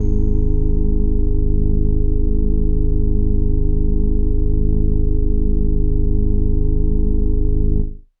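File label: Indie Organ Kawaii G#1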